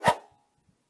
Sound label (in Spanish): ui transicion; Steven Universe - Beach City Drifters